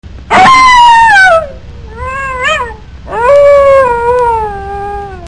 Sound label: wolf; Animals